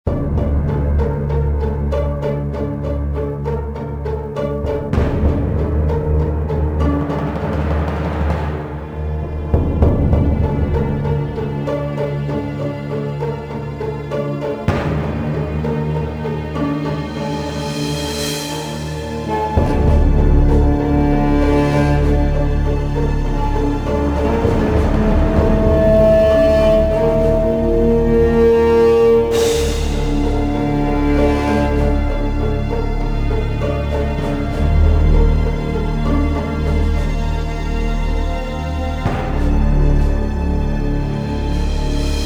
slow, music; Soldier Of Fortune II Double Helix; XBOX; liner

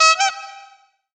BATTLE START; Puyo Pop Fever

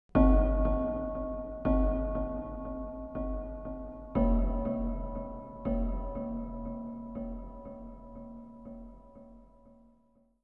hits; Myst III Exile

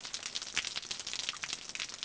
rain runoff; Ambient; MX vs ATV Unleashed

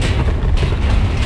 dumpster move

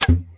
open bottle, tavern; AMBIENT; Pirates Of The Caribbean

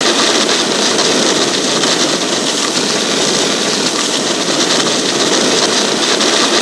Snowboard Loop; XBOX; Amped2

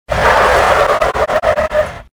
tire squeal; Soldier Of Fortune II Double Helix; XBOX; ambience

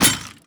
bike drop; hawaii

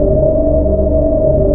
Sentry Hum Loop, Sentry Droid; Aliens

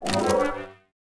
powerup rifle; interface

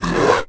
roar

